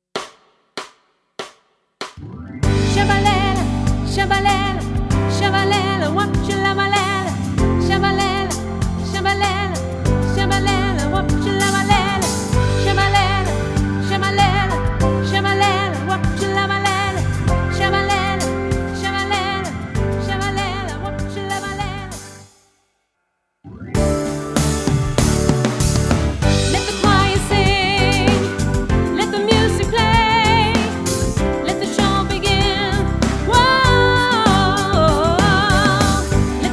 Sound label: Let the choir sing Sopran